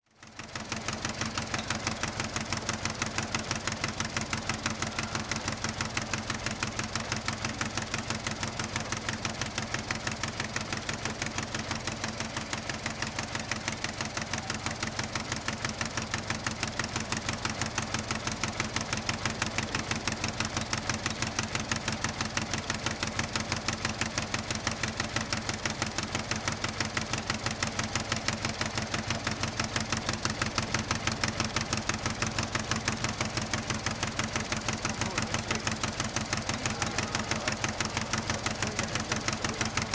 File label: single needle knitting machine made in western germany; liba